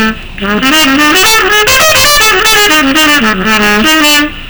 lick

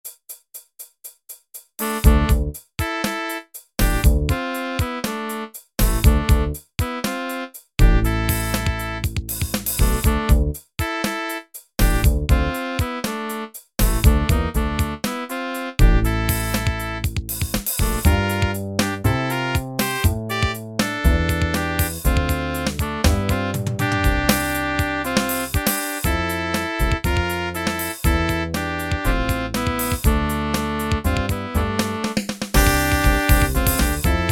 Midi

